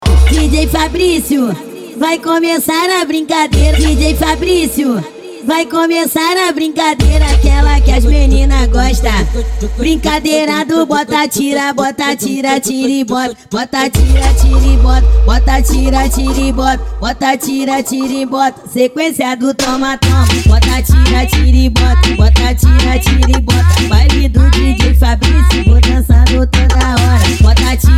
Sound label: funk mega bass